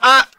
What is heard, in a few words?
"ah"